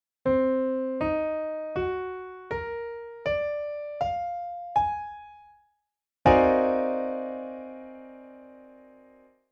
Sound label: chord pf m7b5b13